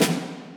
orch snare

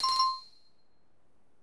SE MAP GET; maimai

